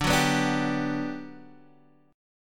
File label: G Major 7th D